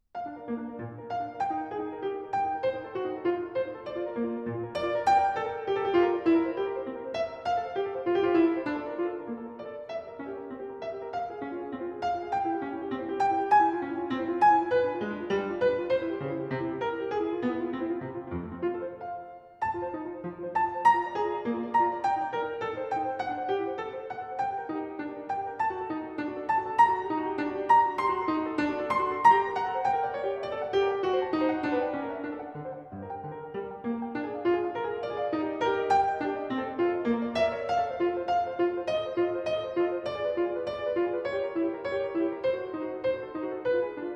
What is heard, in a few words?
Partita no. 1 in B flat major, BWV 825 - Gigue